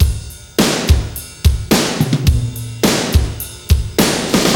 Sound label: drum loop; awe32